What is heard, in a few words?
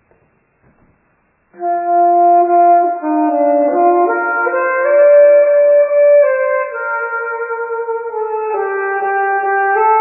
Saxophone; Antique; John Brown's Body